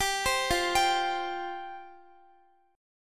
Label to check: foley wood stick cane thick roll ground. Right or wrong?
Wrong